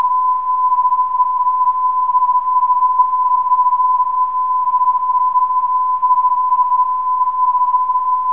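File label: non-directional beacon; BMR; 404kHz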